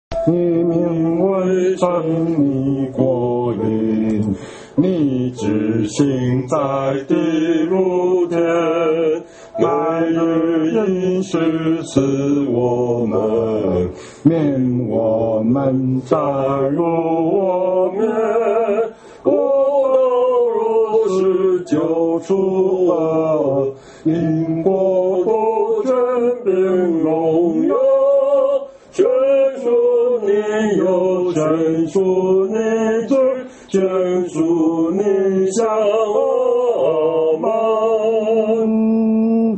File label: hymn; worship